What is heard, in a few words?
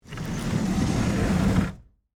Wood Crate Move, Foley, Wood, Crate, Movement, Slide, Large, Box; BlastWave